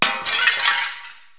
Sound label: shatter, cartoon crashes; KABOOM! - Wild and Wacky Sound Software